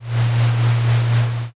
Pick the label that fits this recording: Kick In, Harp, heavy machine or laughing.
heavy machine